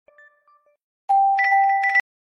Tinkle OnePlus

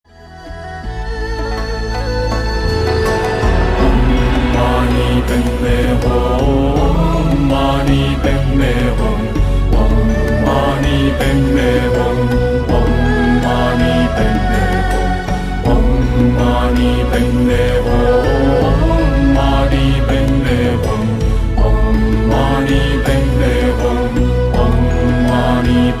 Om Mani Padme Hum

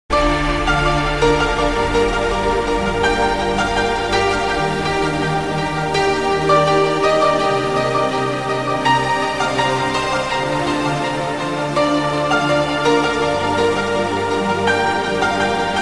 trance

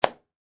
clap sound

